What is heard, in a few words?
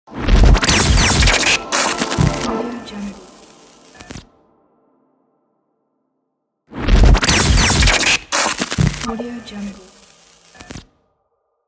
glitch on logo